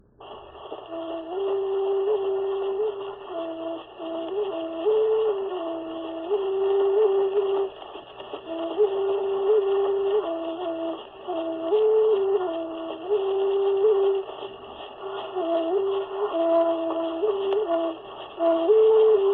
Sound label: Place-name chant, Hawaiian; World Music; Na Leo Hawai'i Kahiko - Voices of Old Hawai'i